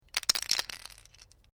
AEROSOLE SPRAY CAN SHAKE SHORT, Can of spray paint; Spraying; Objects; SoundEffects-Foundation1